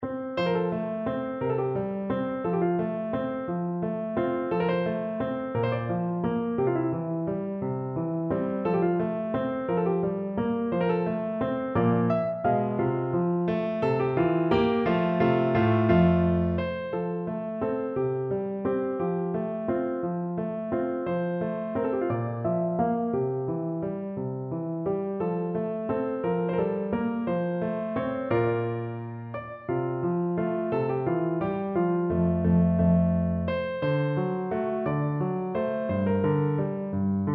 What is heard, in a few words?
paisiello el cor piu piano